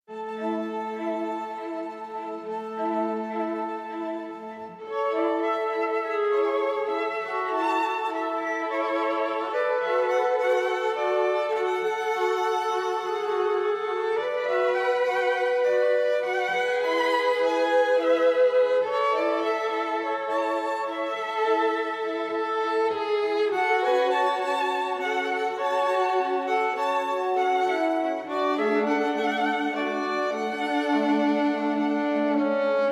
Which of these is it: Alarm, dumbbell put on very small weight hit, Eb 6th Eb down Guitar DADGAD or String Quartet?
String Quartet